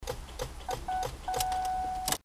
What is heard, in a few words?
Slower Clicking